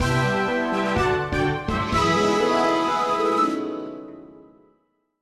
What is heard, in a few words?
Log Complete; hey pikmin